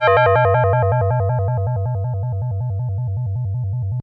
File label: FM Bell FX DX100 A4